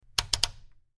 Rotary Dial Turns, switch clicks

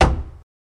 Staff Hit Single, spears & staffs

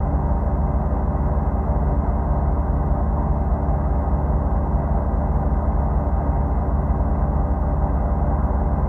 Car Hydraulic Brakes

